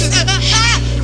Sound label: shreik